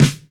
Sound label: Snares; Vengeance Samples; VEH2